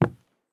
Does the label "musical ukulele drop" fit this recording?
No